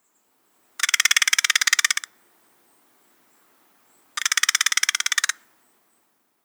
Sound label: amcr rattle